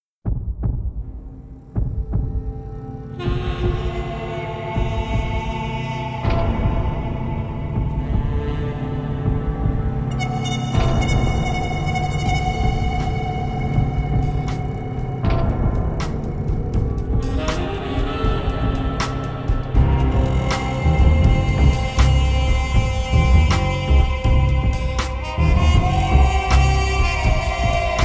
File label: Tension; Nancy Drew: Secret of the Old Clock